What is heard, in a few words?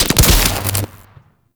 Ocean Electrified Water Start; Warframe; Ambience; Grineer Ocean